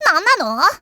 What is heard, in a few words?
shock; makoto